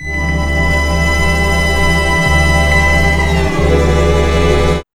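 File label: ORCHESTRA CHORD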